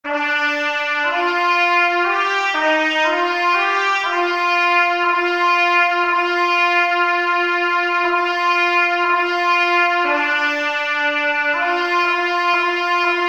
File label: o canada trumpet